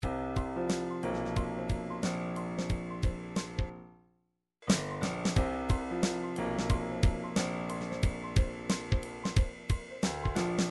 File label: triphop, midi; Newtronic Triphop Construction Kit